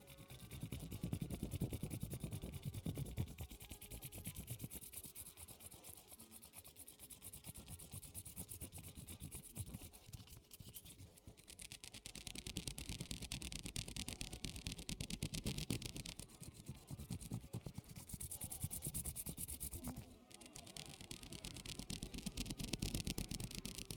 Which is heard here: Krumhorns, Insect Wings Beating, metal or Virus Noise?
Insect Wings Beating